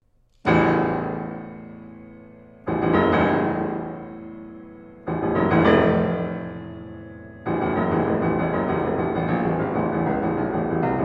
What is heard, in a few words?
Piano Flamboyant